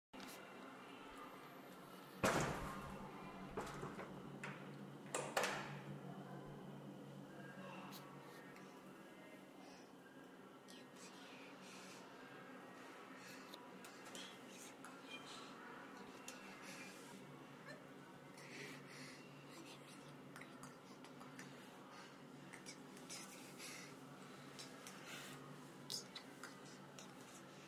ショッピングモール(トイレ)

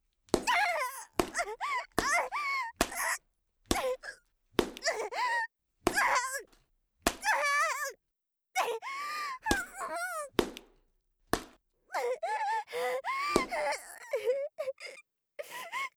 暴力

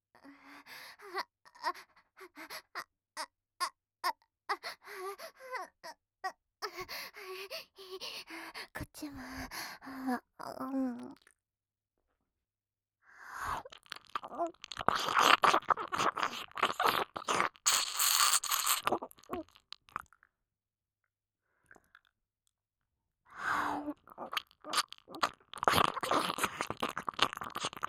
じゅるじゅる左耳舐め奉仕; 密着夢中！じゅるじゅる左耳舐め奉仕

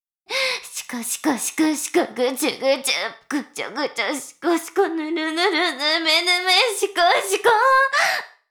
しこしこ音声; 少尉; 牧場戦腺

